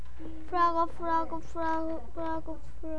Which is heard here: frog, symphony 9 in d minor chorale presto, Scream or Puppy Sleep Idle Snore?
frog